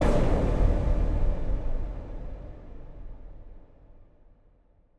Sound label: Cpatain Ghost Hit